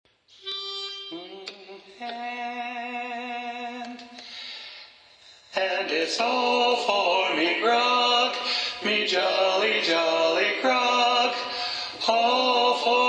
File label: All For Me Grog (Tenor Predominant); sea shanties